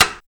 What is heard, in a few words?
WOODY CLICK; TEK PERC3